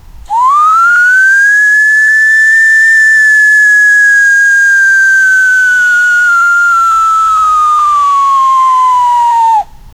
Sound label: a high pitched whistle as a character flies off screen